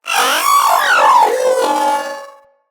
Companion Vocal Death, Pets; Zanuka

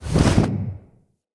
Exit Aim Glide, Foley; Tenno

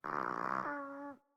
Kitten Snore; Catbrow

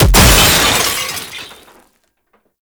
Throne Destroyed; Roathe; Warframe; Tau

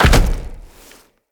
Tenno Land Heavy Rock, Footsteps; Characters

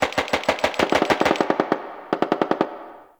Distant Gunfire; Warframe; Ambience; NineteenNinetyNine

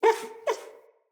Wolf Cub Trapped Call Far; Animals; Wolf Cubs; Orengal Wolf Cub A

